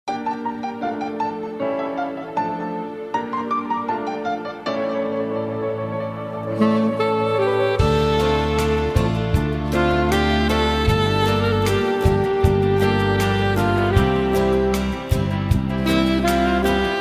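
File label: Lonely Sax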